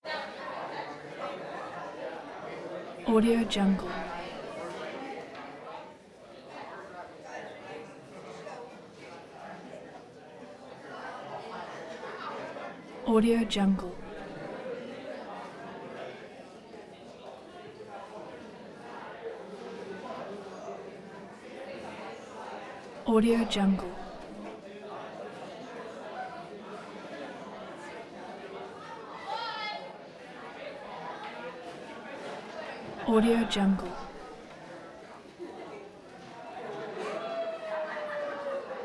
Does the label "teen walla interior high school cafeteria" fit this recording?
Yes